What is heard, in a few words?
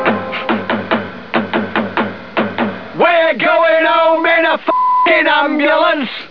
ambulan; We`re Goin` Home In A F***** Ambulance; Mark Radcliffe and Lard